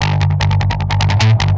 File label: Drive, Industrial Nasty